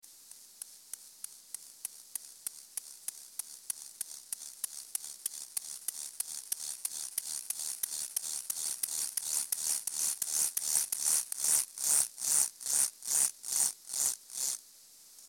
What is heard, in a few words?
Chorthippus mollis lab recording 26°C